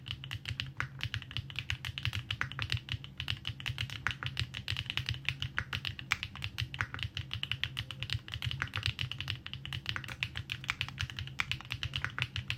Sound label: typing sound, custom keyboard; neo65 cu